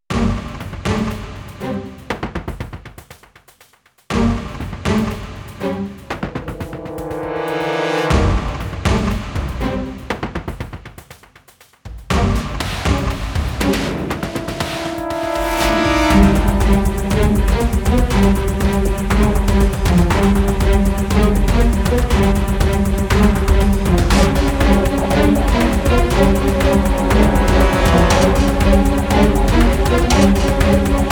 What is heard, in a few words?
Dramatic Cue